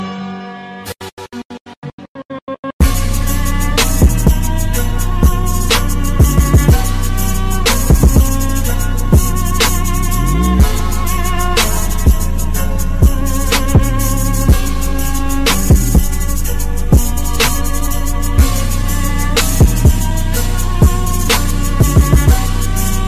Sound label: Trap Remix; Naruto